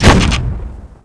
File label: ripp fire; xatrix; weapons